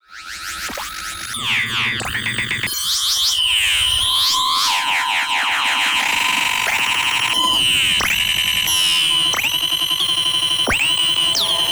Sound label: texture transporter